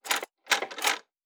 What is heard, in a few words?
Metal Tools